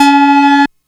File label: Analog Sound one shot, Synths; Sonic Foundry (Sony Creative Software); Electronic Point Blank